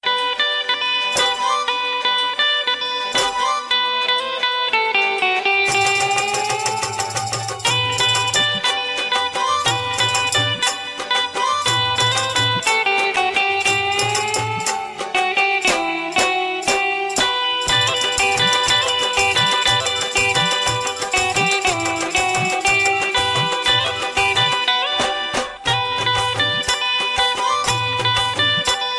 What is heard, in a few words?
Love tone